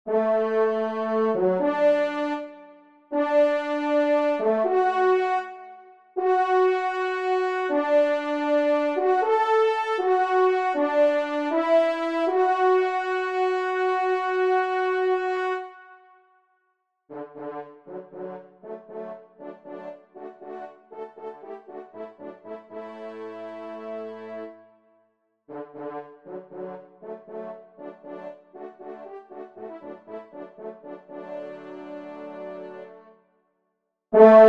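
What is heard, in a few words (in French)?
trompes, ensemble; Rendez-Vous de Chasse; Rossini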